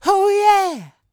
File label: D YEAH, Vocal R&B Dance; DB DANCE